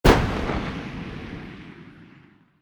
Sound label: explosion